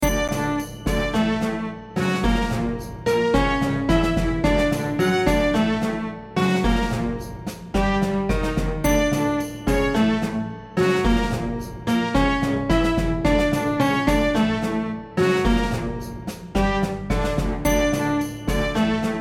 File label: Orchestra